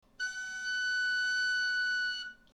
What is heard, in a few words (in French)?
la, galoubet; Note La - 2ème interligne